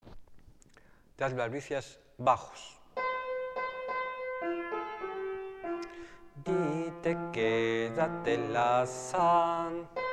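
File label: bass; Dadme albricias; anónimo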